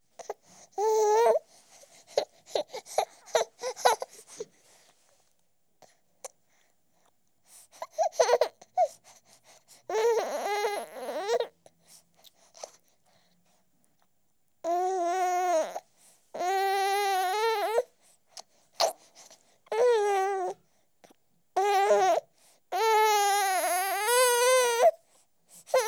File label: Week Old Boy, Wailing While Being, Babies; BBC Sound Effects Library